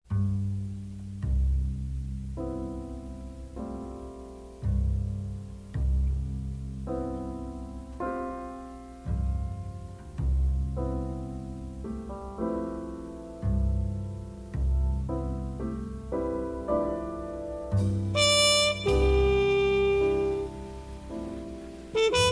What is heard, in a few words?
Flamenco